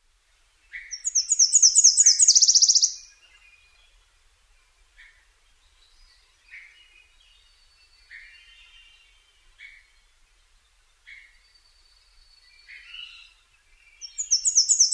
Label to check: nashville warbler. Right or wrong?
Right